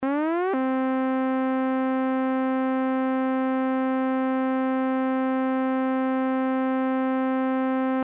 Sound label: ADSR Mod Env; synthese sonore les enveloppes